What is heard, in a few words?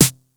TR909 SD; East West - Global Sounds